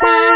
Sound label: gremlins chord